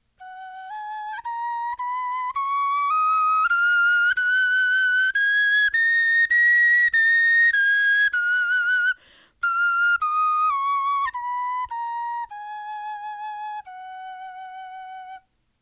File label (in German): tonleiter, knochenfloeten